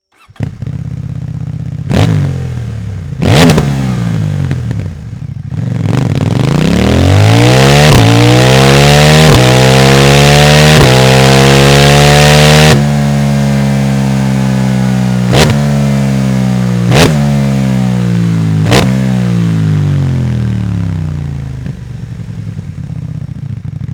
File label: Stock Exhaust CF MOTO 800 NK; Akrapovic; 2024-2025